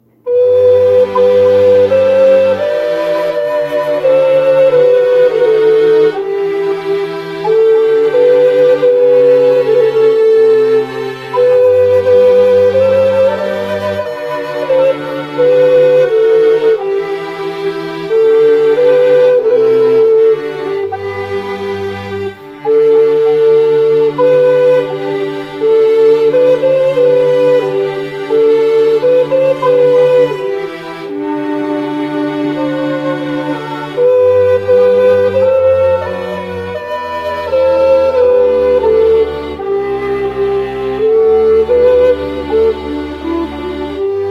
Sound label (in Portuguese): flautas, ode alegria